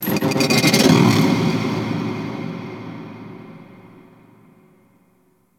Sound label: Metal Impact Large, Ambience; Warframe; Cephalon